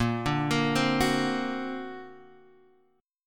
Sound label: D Augmented 7th Bb, guitar